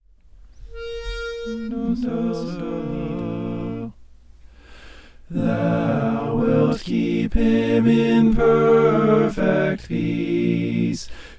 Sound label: thou wilt keep him descant